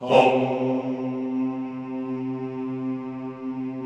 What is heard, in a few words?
CHO C2, Vohmm Hohmm; Sound & Vision; Gigapack I CD 2